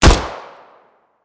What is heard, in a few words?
Designed Gunshot